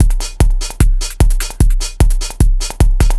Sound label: Dance Drumloop; Drums; Dance Beats